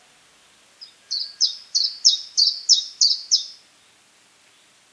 chiffchaff song, Sometimes sings the two notes randomly instead of alternating.; Chiffchaff (Pierwiosnek)